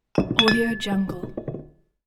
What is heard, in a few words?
wine bottles clinking